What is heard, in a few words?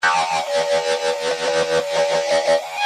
PULSAR, GUITARS